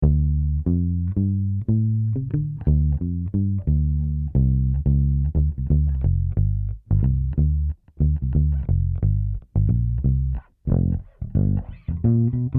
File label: Funky Bass